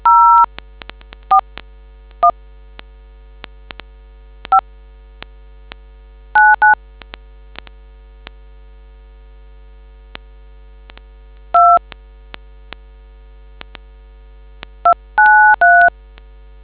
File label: dtmf